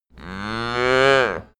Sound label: cow moo dry